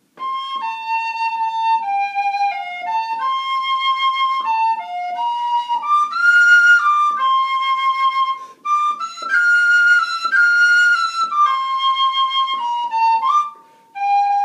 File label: WHISTLE